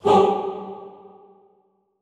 HOO G2, Classical Choir, SHORT HOO; Best Service; Extended Classical Choir

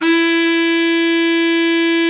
BARITON, Baritone sax; Best Service; Killer Horns

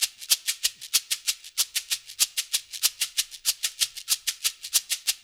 LOOP, Percussion; InVision Interactive; Percussion Slam

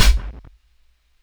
HIHAT EDGE; DRUM N BASS:JUNGLE BEATS; DRUM N BASS KIT 2

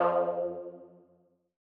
Lead F2; KG MegaSaur

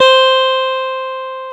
GTR, Dual Guitar; Club-50 - Foundations Roland

